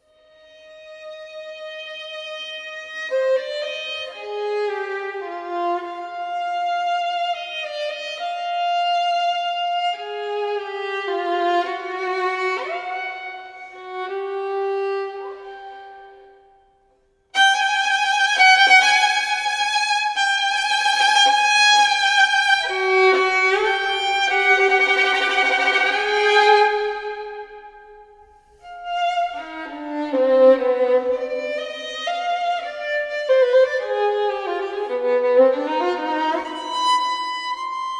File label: for violin